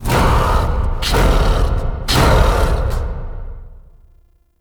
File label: Mirror Defense Start Wave; Warframe; Entrati